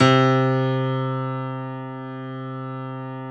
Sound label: C2 YAMAHA L, GRAND; NS GRAND